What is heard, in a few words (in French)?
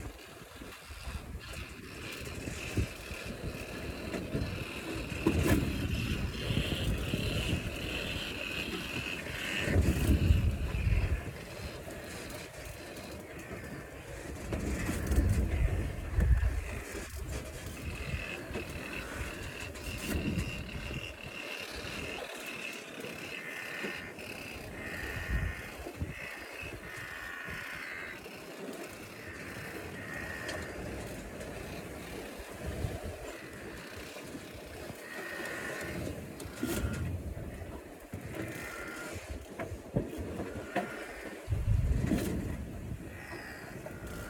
Noddis bruns; Milagro; karukinka; club de voile